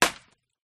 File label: industrial axe chop strike wood single; zapsplat